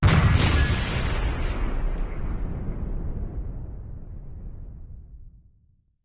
Explosion Long; Puddle; puddle plus ios gamerip 2018

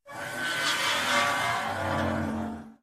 Saw Creak

